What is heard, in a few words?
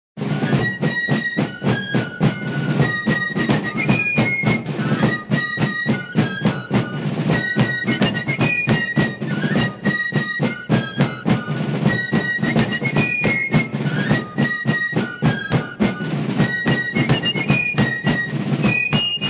Drum & Bugle